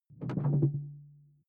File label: sci-fi alien tech; swooshes-swishes